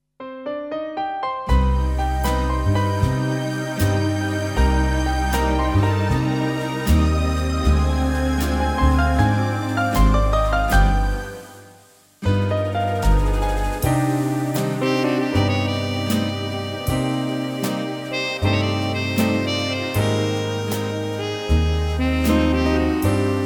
orch ballad

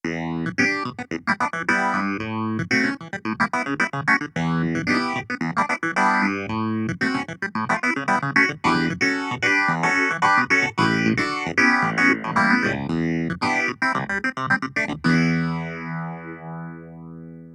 clav; kawai mp11

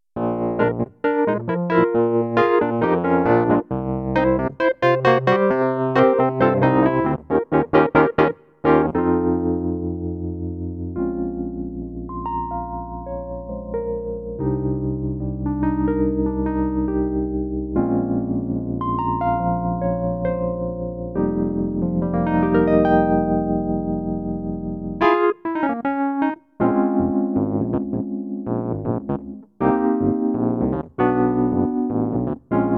reed; kawai mp11

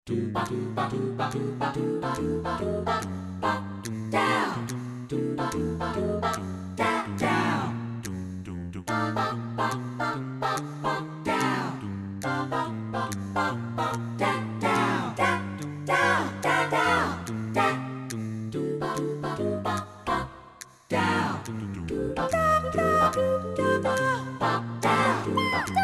vocals

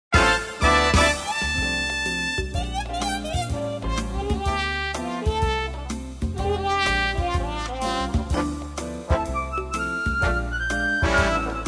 brass

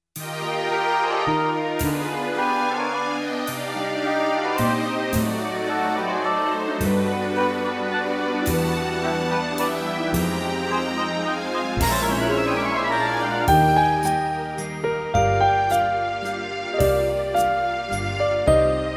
waltz

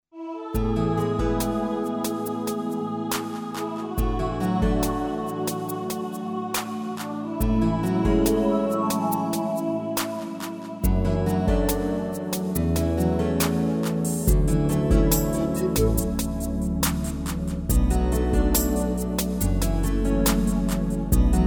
vocal & pad

